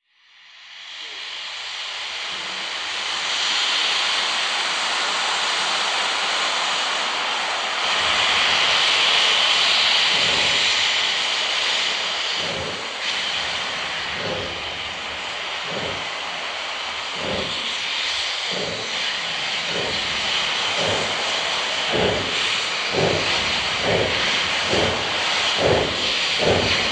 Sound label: Steam Release Train